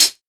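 dark tone closed high hat sound e key, hihat tuned to the E note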